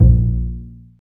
STR CB JZ, Cb JazzPizz

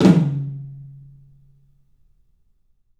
TOM, 3D Drums, CLUB TOM; 3D Sound

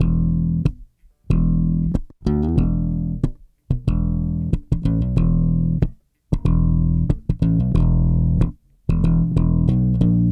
bass, analog funk

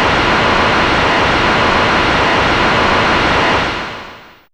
Gurg, Fairlight CMI IIe; Effects

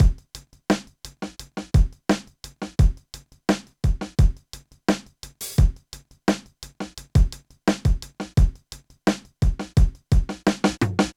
Drum Loops Minimal; Zero-G Smoke

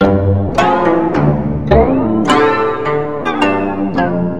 KOTO LP FX, KOTO FXLP; USB Soundscan vol.60; AKAI

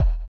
SONAR R; Spectrasonics; Metamorphosis

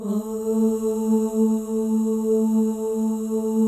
FEM OHS, Symphony of Voices; Spectrasonics

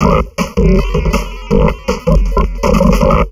160, Drum Loops Crazy Processed; SONMOD; USB Soundscan vol.07; AKAI

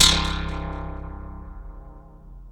BELLO, BELLS; Back In Time Records; Big Bang; TDP+BELLS2

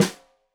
Snare Stereo, Drums; Deeply Sampled Drums

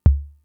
Kick, Drum Machines Fricke MFB-522

